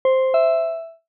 eyecatch soft alert pair

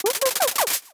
sf encrypted burst

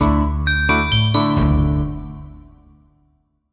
jingle game clear

